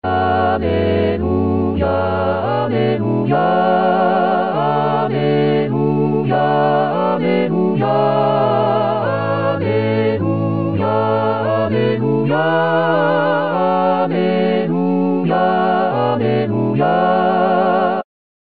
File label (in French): Alleluia Festif, Chorale; 2016 Paques